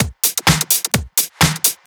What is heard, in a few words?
Straight Loop 128 BPM, House